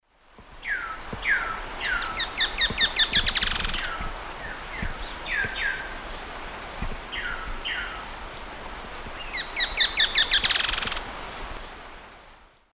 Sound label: Cranioleuca vulpecula, song; S. America; Eastern Lowlands